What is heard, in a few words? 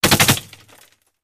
Bullet Impacts Metal